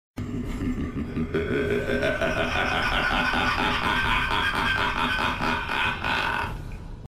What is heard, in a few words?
evil laugh; eliphas